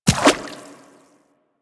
Inf Medium Run Water, Infested Footsteps; Warframe

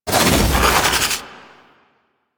Fairy Flight Cast; DE; Warframe

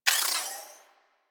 UI Common Ability Cooldown; Warframe Ability

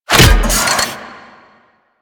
RPG Knockback Armour Impact; Hellion Elite

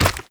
Proto Infested Runner Step Default

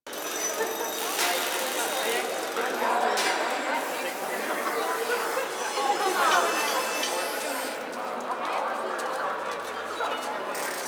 Ambient Loop; Warframe; Venus Open World; Venus Solaris United

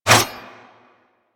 Dagger Whoosh; Dual Daggers; Weapons

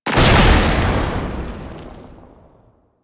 Alchemy Grenade Explosion Fire; Warframe; Entrati

